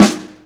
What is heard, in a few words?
Dirty Snare, Snare One-Shots; KJ Sawka; Drum n Bass & Beyond; Drum Tool Box